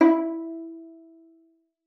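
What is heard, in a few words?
violin pizzicato E4; Xsample VOL-5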